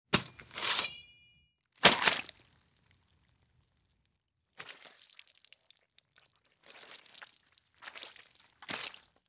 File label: Machete Grab and Chop Hand; Fairlight Sound Lib